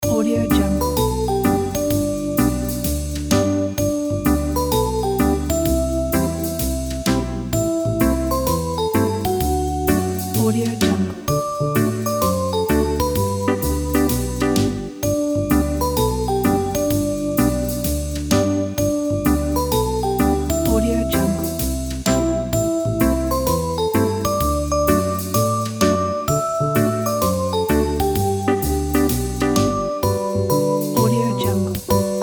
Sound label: shopping for new jingle bells; tortoisetree